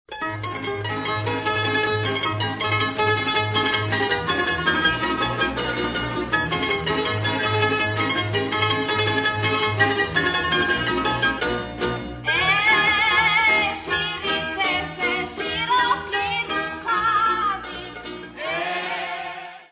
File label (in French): Kolo, Croatie